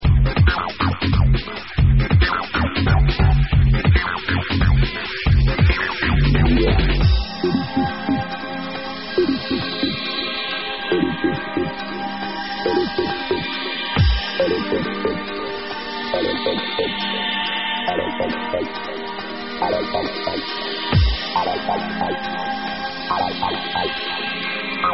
break

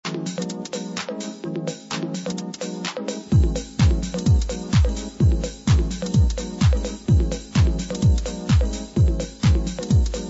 hardcore hip house

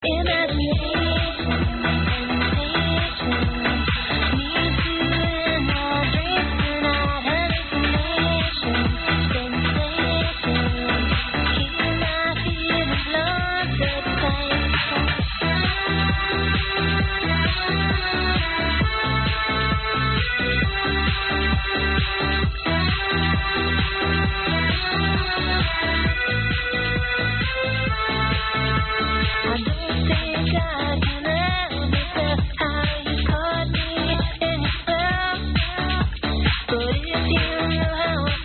old techno

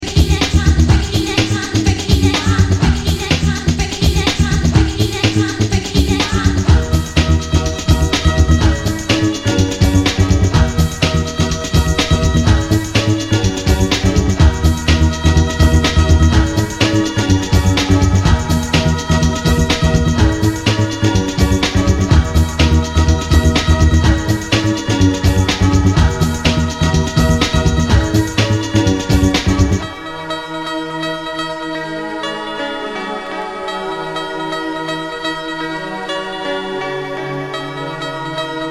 piano passions

